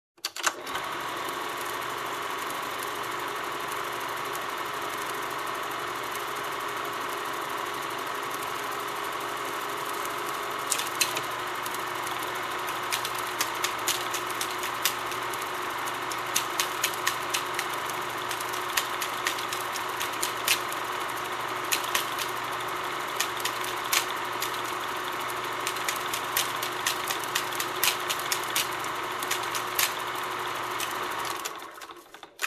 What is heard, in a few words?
Motion-picture camera rolling